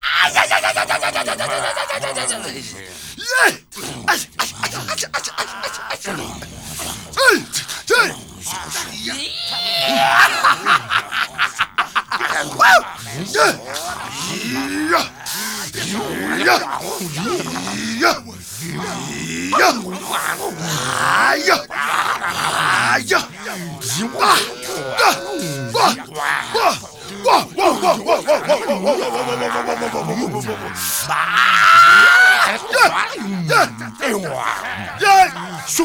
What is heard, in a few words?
WILD MEN, World Voices, AFRO NOISE; ILIO; Vocal Planet VOL-5